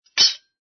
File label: METAL DROP